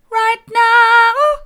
right now mid, vocal

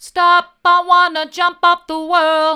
stop i want to jump off the world mid, vocal

